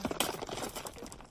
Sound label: Percs; Edward Digs Himself Out of the Rubble